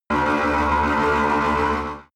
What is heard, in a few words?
extrinsic creak; energie